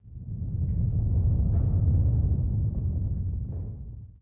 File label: rumble; The Elder Scrolls III - Morrowind